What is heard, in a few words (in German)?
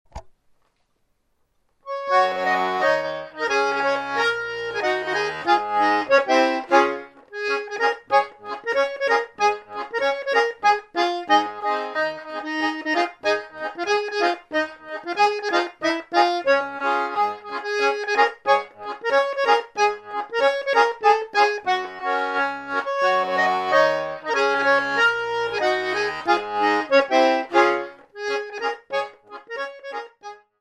1 stimme; oergelinoten